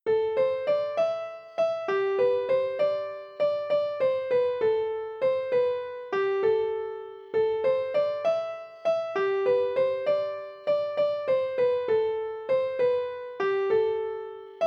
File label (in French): valse; boeuf trad